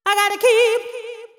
deep house female, 125, A, vocals

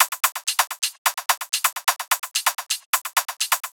tops 128; Anthemic.Electro; tops loops

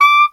STAC D5, Clarinet Stacato; DirectWave; Varazdin Orchestral

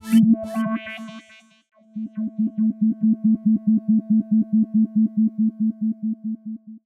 SPACE SYNTH 140 A; SpaceSounds

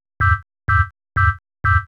house synth 125 A, keys

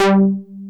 FAT SEQU. G3

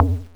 BONGO; KillerTweaksII; DirectWave

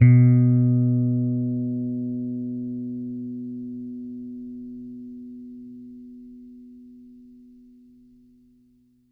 GOOD 80 FINGER B2, Bass; Bass Mace; DirectWave

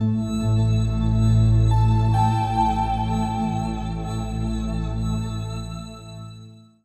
SPACE PAD 140 A; SpaceSounds

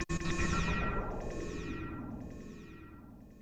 FX Industrial 140

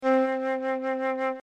Fluyte, flutes; Real Samples

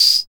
CR-78 TAM

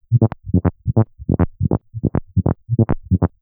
TECHNO BASS 140 A

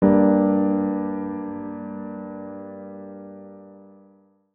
G Keys, One Shots; Keys & Synths; Touch Loops; BlissedOutBeats

